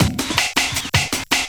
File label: LOOP L, Drum & Bass 160 BPM